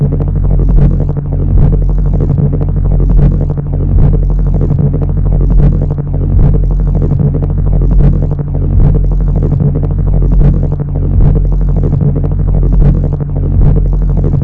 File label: grav hold